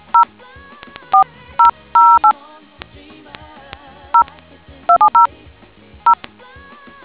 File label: dtmf